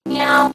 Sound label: cat